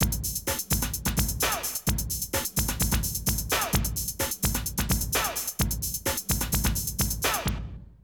Drum loops, Rave & Hardcore

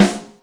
Nashvill, Yamaha DTXpress, Acoustic Snare

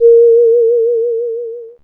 Synclav FM, WIND, A#; Big Fish Audio

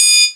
Yamaha Tg33, Drums

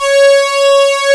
OBX STRING C5; E-MU Formula 4000 Series Vol. 3; Analog Odyssey

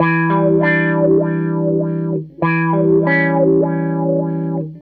SG Guitar Loop

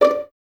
Violins D5 Pizz, String Ensembles; Miroslav Vitous; 11 Violins

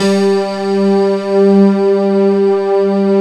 D50 Stak, Roland

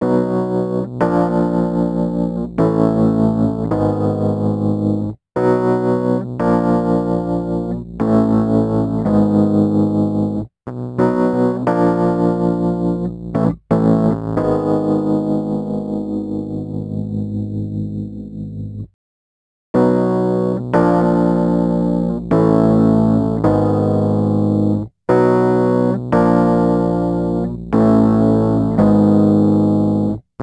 Rhodes Attacks; Ueberschall

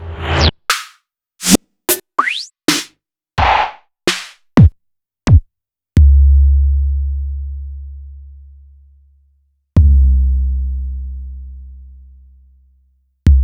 Roland SH-32 Drumset

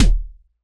Elec Kick; Roland BK-5

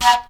FLUTE LIN

